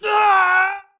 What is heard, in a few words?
grunt death crush; Titanfall 2